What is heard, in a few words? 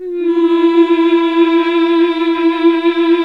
MMH E2, CLASSIC VOX